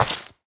step, footsteps, player, mud

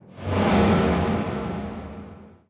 shake wet, strider, combat, damaged; creatures

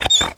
injured out, breath; reviver

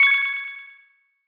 paradrop idle; Counter Strike Model Pack; CS:GO; survival

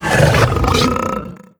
idle in, barnacle; Half Life Alyx

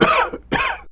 cough; Half Life Model Pack; Half Life 2

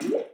paint trickle; Portal 2

